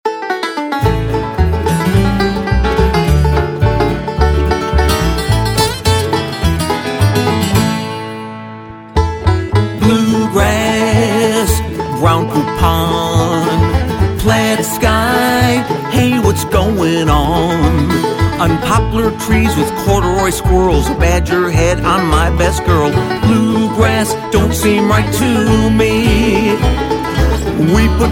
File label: Bluegrass with fade